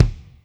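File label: kick R&B RM, Alesis D4 drum machine; 300 Drum Machines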